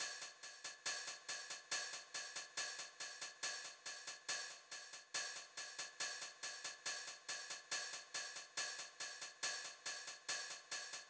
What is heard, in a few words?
Alien Hat G; Bust n Rush